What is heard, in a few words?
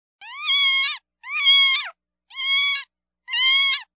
Distressed Kitten